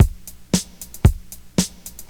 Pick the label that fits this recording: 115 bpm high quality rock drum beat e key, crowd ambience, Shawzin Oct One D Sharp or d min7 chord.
115 bpm high quality rock drum beat e key